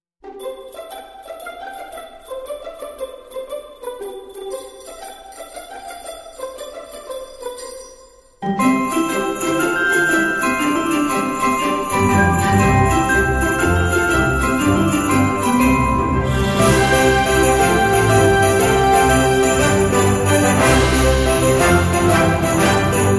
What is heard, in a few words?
sleighbells toboggan ride